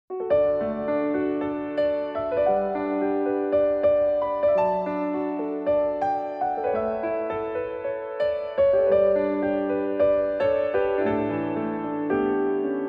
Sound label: piano only; Sword Art Online